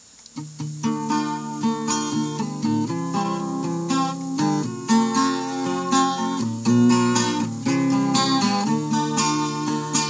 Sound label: instrumental